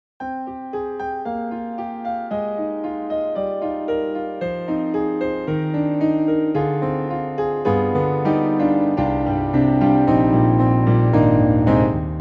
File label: YAMAHA MOTIF-RACK XS, Romantic Piano